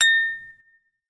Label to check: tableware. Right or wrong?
Right